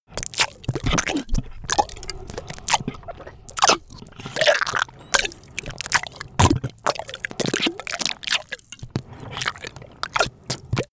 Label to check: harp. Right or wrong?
Wrong